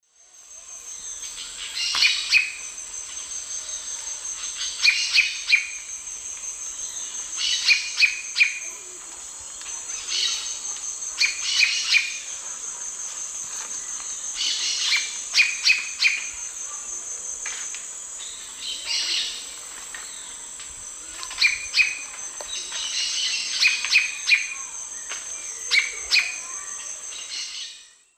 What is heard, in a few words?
Turdus hauxwelli, calls; S. America; Eastern Lowlands